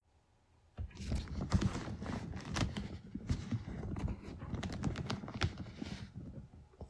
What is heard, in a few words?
Toolbag leather movement